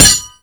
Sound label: knife metal; Call of Duty Modern Warfare; physics